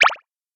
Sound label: Beeps